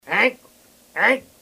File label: Deer Stopping Sound